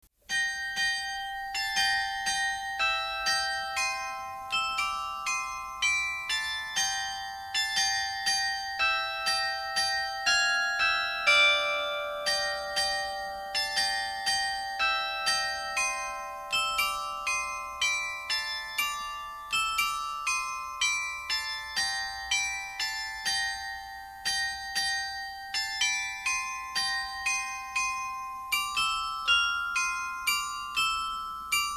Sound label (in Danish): melodi